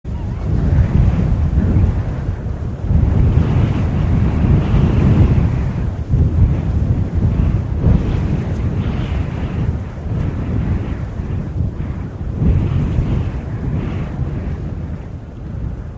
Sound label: wind